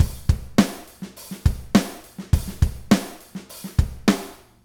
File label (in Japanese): ゴーストノート